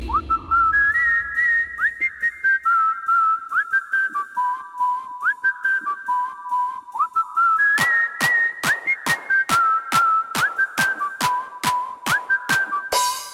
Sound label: The Whistle